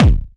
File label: kick, drumkit; drum-machine